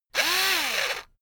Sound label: electric drill screw in medium; maschinen; bohrer; soundpool